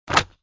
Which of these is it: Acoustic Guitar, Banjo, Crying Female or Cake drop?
Cake drop